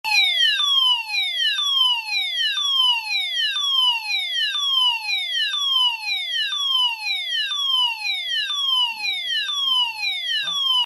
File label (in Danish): brandalarm